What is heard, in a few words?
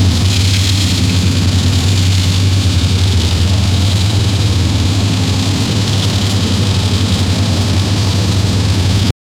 warfare heavy machinery